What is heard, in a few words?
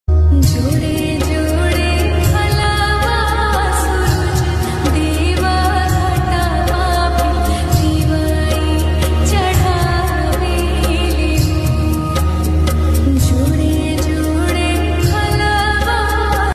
song ringtone